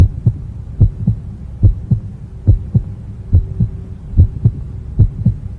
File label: heartbeat